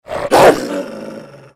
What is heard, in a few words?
wolf